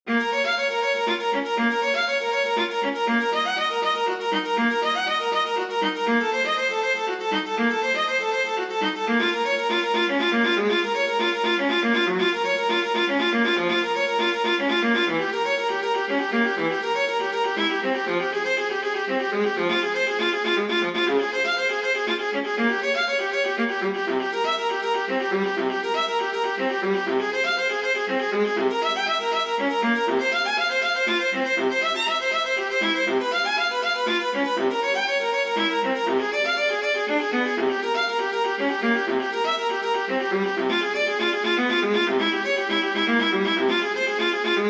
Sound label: for viola; bach johann sebastian; praludium minor